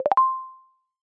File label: Tutorial Success mono; iOS Sounds